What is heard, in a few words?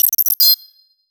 futuristic data upload so, sci fi